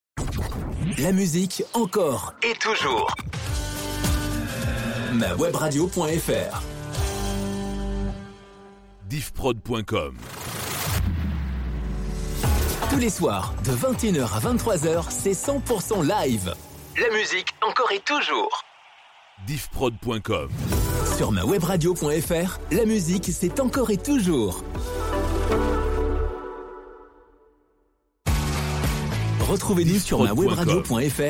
Webradio Jingles

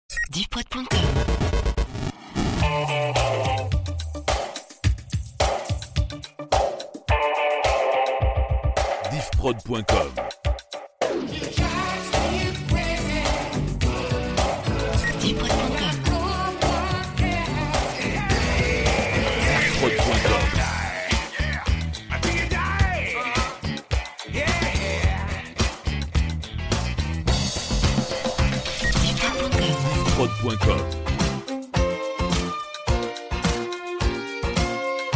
Medley Pop Rock Funk

